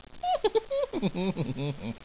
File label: Gnome Laugh